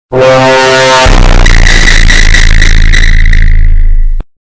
role reveal earrape; among us

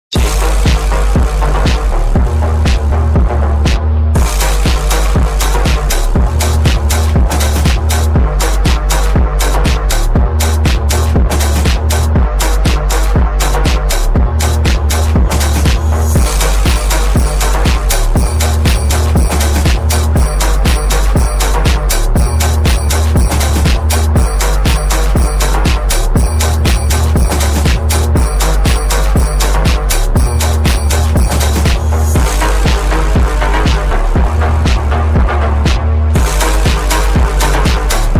phonk

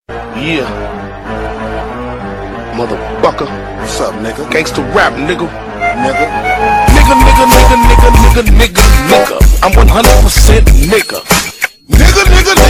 Gangster rap

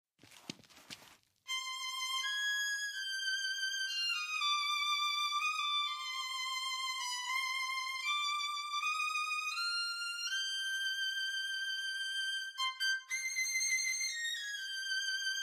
tiniest violin; fortnite emote

